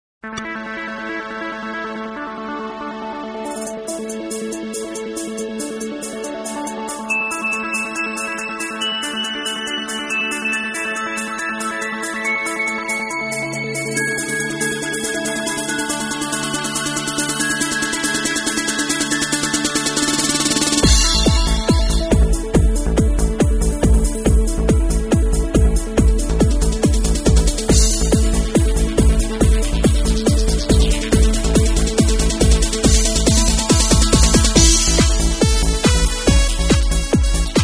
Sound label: dance mix; glasgow celtic fc